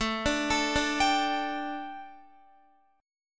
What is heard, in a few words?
A 7th Suspended 4th Guitar, chord; A7sus4 arpeggiated